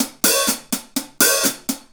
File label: Hi Hat Pattern